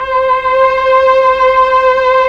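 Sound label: strings Mellotron violin; Roland LCDP09 Keys of the 60s and 70s 1; CMB Melo.Combo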